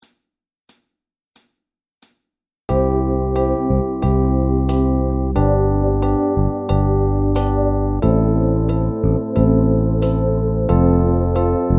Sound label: Drum Grv Med